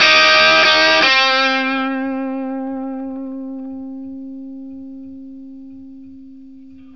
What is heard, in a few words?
BLUESY C 60, Vintage Blues Guitar; AKAI; USB Soundscan vol.22